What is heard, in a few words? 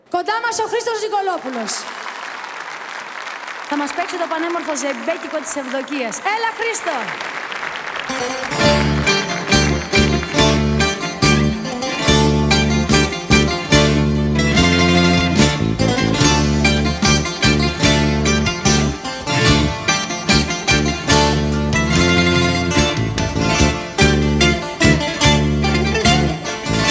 Instrumental Live